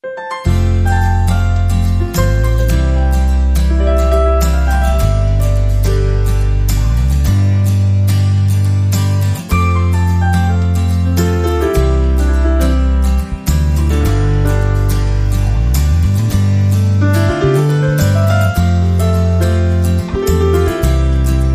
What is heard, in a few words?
Easy, No Drums